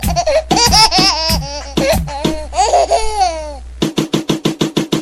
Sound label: baby rap short